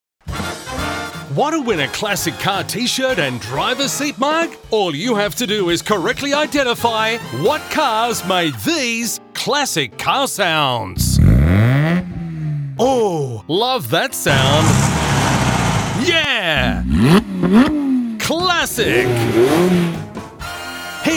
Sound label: Classic Car; GuessTheSound